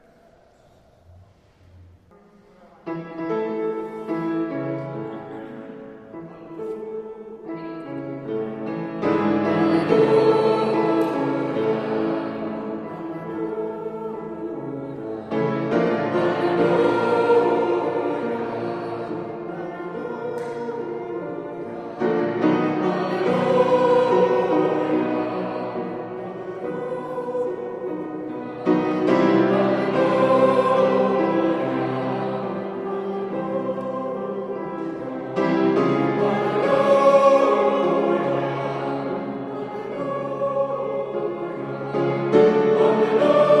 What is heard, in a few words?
warmup alellu oo oo yah